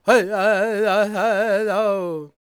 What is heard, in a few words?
C# MIN, World Voices, LAKOTA